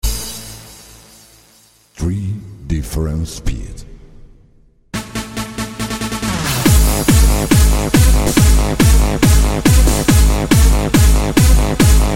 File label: funny Italian techno tone ringtone